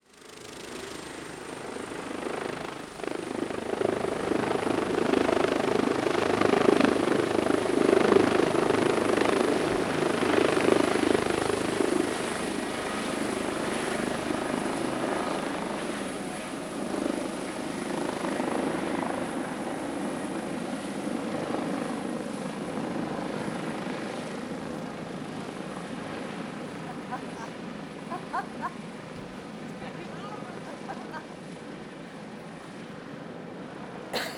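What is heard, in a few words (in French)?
helico, helicopter; offenburg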